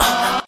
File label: ah group of men; Voice; ooh ahh